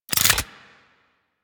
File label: Pistol Reload; weapons; Warframe; Thanotech